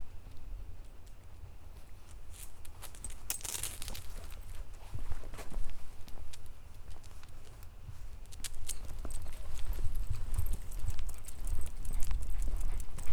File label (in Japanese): 柴犬と散歩